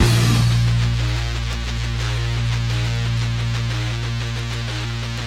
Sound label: guitar riff